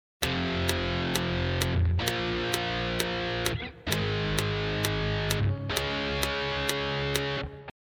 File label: four power chords, Add a click track to it; F5, A#5, G#5, & C#5; Power Chord Lesson